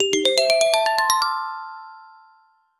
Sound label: a cheerful xylophone jing